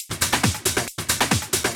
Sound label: 137BPM Loop, Drums & Perc; Amajor